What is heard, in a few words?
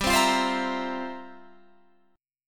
F Major 7th Suspended 2nd Suspended 4th, G, guitar